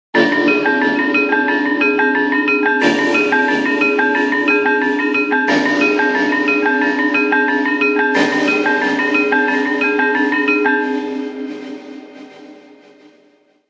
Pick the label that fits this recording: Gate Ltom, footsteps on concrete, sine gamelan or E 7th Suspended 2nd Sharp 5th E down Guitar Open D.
sine gamelan